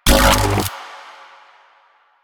Updated Shot, Weapons; Warframe; Moa Pet; Tazicor

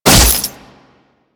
Spectre Impact; Condemn; Warframe; Priest

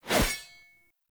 Short Sword Whoosh; Sinecure Short Sword

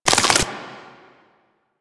Proto Infested Maggot Step; Warframe

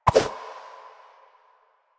Run Whoosh, Footsteps; Warframe; Lasrian; Lasrian Soldiers; Foley; Lasrian Butcher

